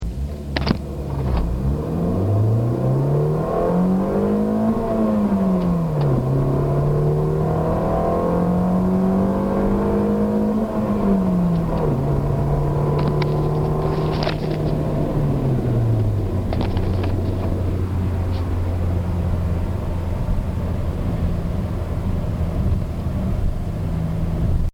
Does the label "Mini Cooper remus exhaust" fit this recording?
Yes